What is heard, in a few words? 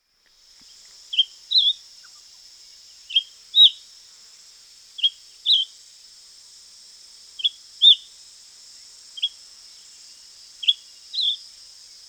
Familiar chat